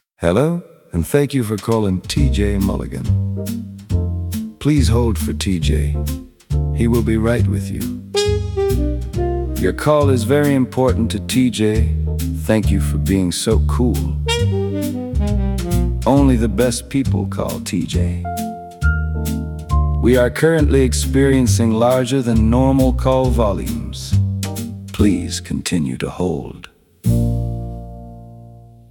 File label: slow piano jazz tele greeting